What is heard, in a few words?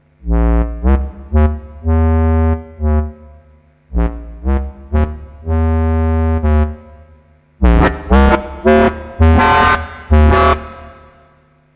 clarinet